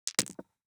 DICE on Felt, Throw and Roll, Standard, 6 Six Dice; Samples; ArticulatedSounds; DICE Sfx Party (Extended)